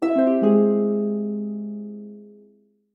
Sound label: Harp Lose; Battle For Blood Epic Battles Within 30 Seconds